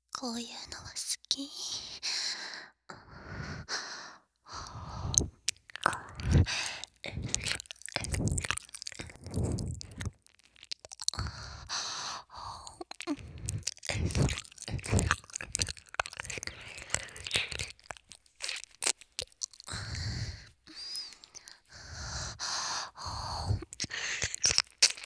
耳舐め手コキ; トイレで耳舐め手コキ

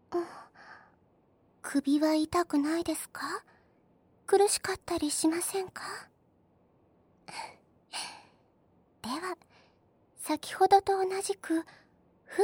膝枕でお耳吹き＆耳かき（左耳）; いやでんっ! 〜癒やしの寝台列車「あかつき」〜; 佐藤聡美; 列車音・海の音

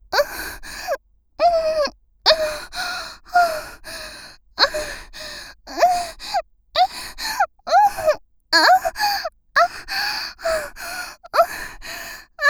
アドリブ喘ぎ 感じ始めた

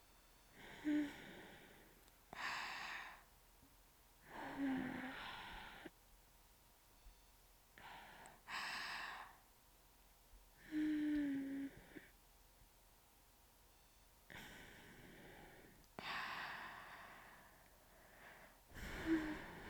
一緒に添い寝（寝息＋寝言）

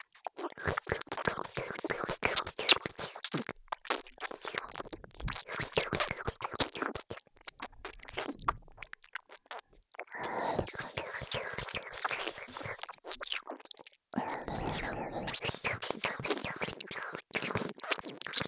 耳舐めオンリーMIX（入りナシ）

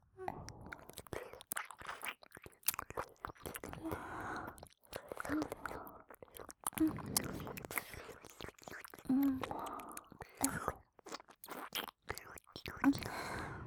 耳舐め,ASMR; 恋人として親子で; 癒し系専属ナースの七海さん六海さんに愛されちゃうバイノーラル

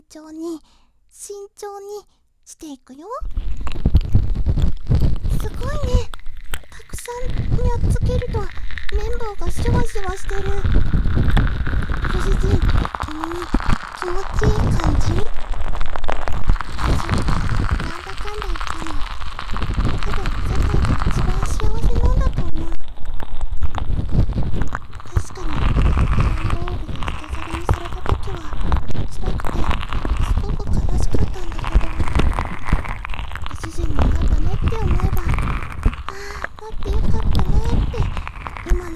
女の子の炭酸耳かき左耳, ASMR

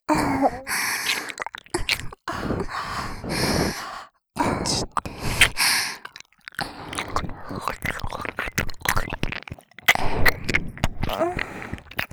耳舐め 喘ぎ; エッチしよ

